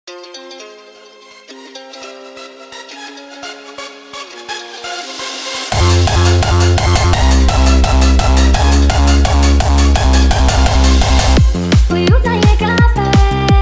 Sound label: hardstyle remix